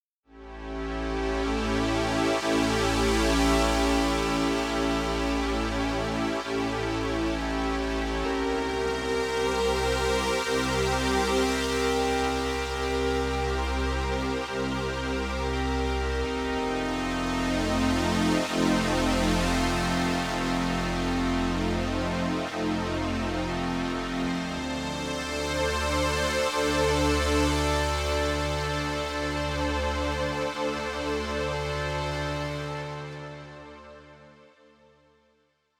brassy pad

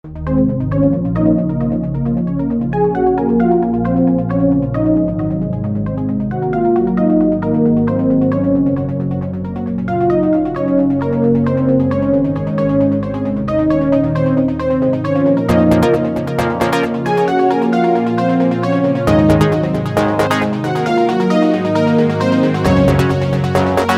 trance

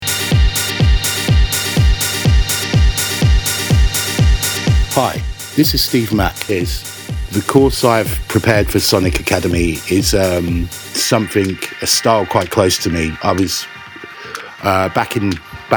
disco house

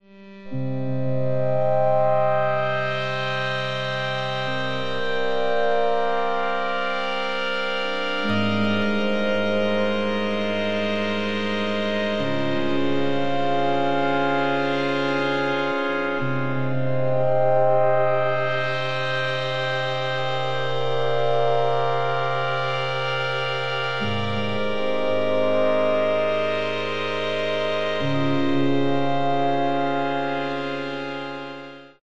ethereal pulse wave

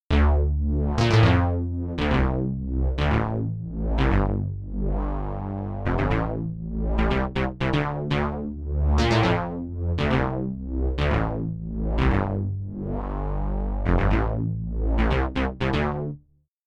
round bass

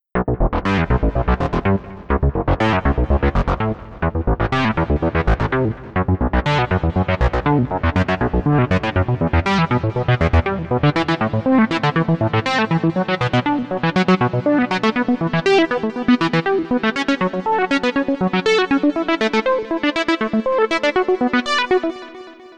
slider rhythm

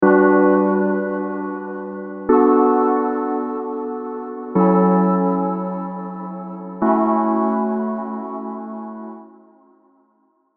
serum chords; chiqui audio

